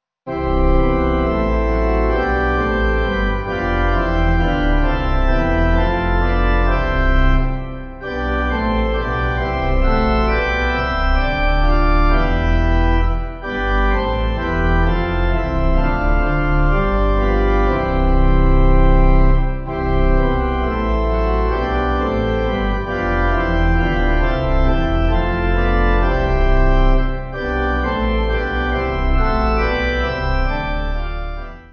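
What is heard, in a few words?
pipe organ hymn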